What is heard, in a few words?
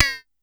Pollard Perc, Syndrum